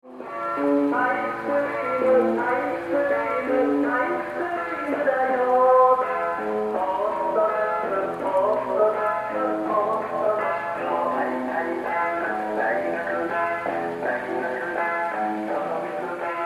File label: noise; daigakudo